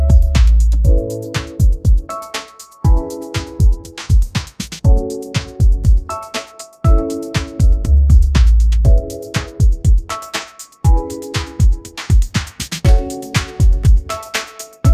sunshine pop chill groove

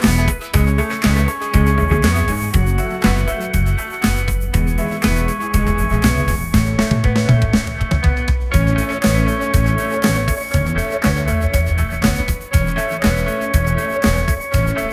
upbeat pop melody; ai

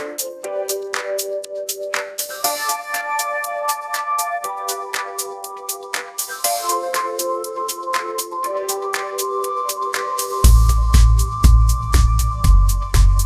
upbeat pop melody, ringtones

